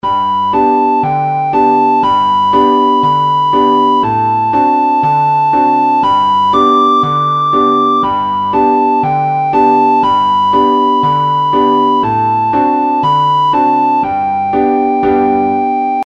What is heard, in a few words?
Merrily We Roll Along recorder notes